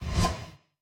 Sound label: Camera Zoom In